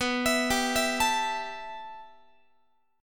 B 7th Suspended 4th Sharp 5th B down Guitar; B7sus4#5; arpeggiated; x,19,19,17,20,20; 4A,4E,5A,5D,5G#,6C#

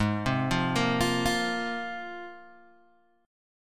Ab 6th Add 9th down Guitar Open G

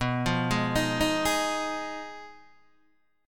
B 6th Flat 5th B down Guitar DADGAD

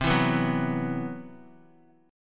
C Major 7th Flat 5th down Guitar; CM7b5 strummed; JGuitar

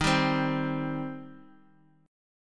Eb 6th Eb down; Eb6 strummed; JGuitar

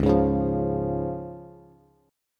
C sharp Major, Bass; strummed; 9,11,11,10; JGuitar

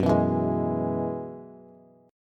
Gb 9th Suspended 4th Gb down Bass, chord; Gb9sus4; strummed; JGuitar

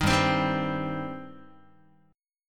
G Suspended 4th Sharp 5th, Guitar; strummed; 6,3,3,5,4,x; 4A,4E,5A,5D,5G#,6C#; JGuitar

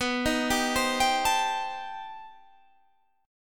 C Minor Major 13th B

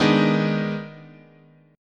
D sharp Major 7th Suspended 4th Sharp 5th, chord

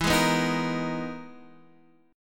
E Major Sharp 11th, Guitar, Drop D; chord shape

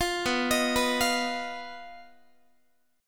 B Major Flat 5th B Guitar; arpeggiated; 20,19,18,21,21,x

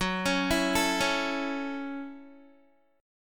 F sharp Minor 7th F sharp, Guitar; arpeggiated

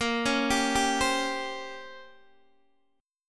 A sharp Minor 6th Add 9th, Guitar, Open D

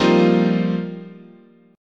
F 7th Suspended 2nd Suspended 4th, chord

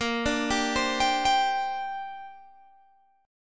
Bb 6th Add 9th Bb Guitar Open G

